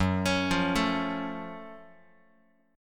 F sharp Minor Major 7th F sharp Guitar; arpeggiated

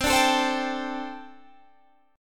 C 6th Suspended 2nd C

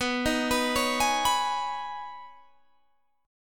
B 6th Add 9th B Guitar Standard, chord; B6add9 arpeggiated

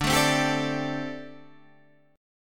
G Suspended 2nd Suspended 4th D

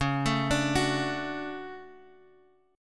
D Major C#, guitar

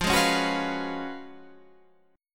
Gb Major 7th Suspended 2nd Suspended 4th

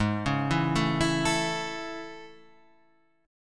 A Major Ab; arpeggiated; JGuitar

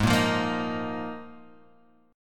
Eb Suspended 2nd Suspended 4th Ab

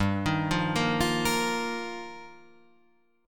G Minor 7th Sharp 5th G, Guitar Half Step Down

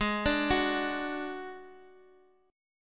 D-Major Flat 5th, G sharp

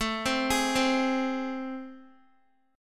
A Minor Major 7th, down, Guitar; AmM7; arpeggiated

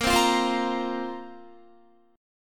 Bb Suspended 2nd Bb down Guitar; strummed

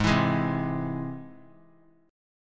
G sharp Augmented G sharp; G#+ strummed; JGuitar

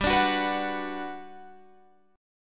G Major 7th, G, down, Guitar, Open G

